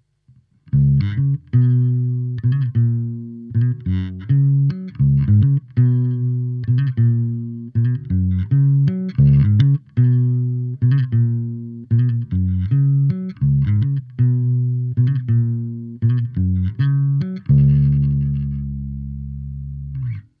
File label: Bass; lampes